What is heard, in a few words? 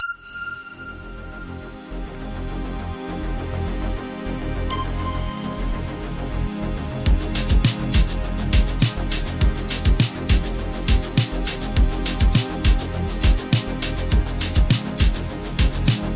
Synthwave